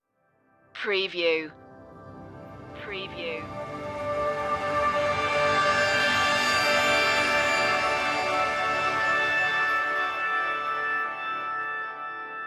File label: free stinger